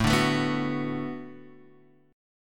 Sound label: B Minor 7th A, chord